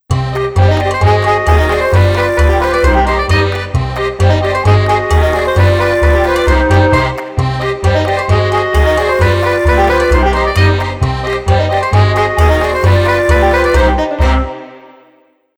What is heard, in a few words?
Short Silly